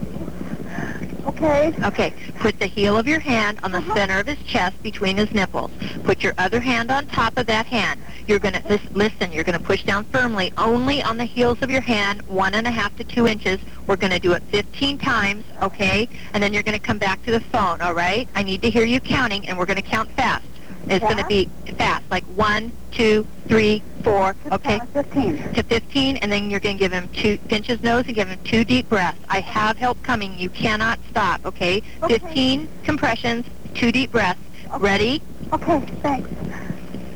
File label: chest compressions; Dispatcher Assisted Chest Compresstions; telephone CPR